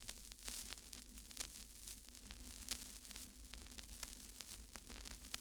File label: Vinyl Crackles